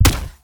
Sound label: imp volley